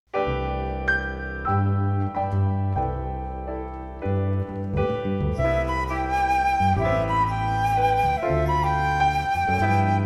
Trio, Jazz Band

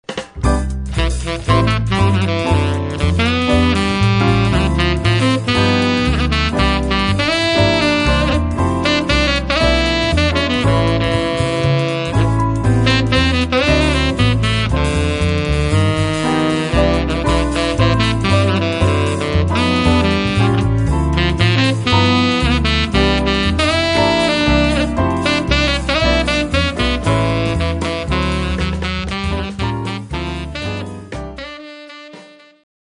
Quartet, Piano

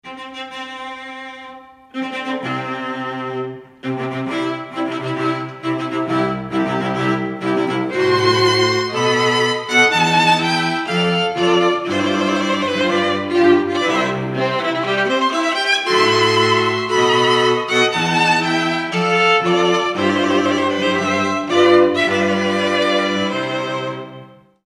Wedding March Quartet, classical string quartet

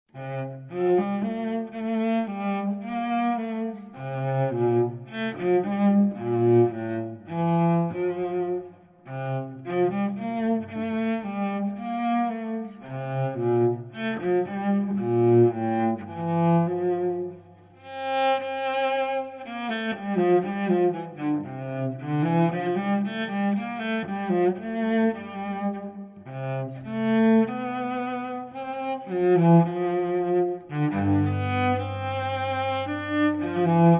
solo cello; Wachet Auf (Sleepers Wake) by Bach

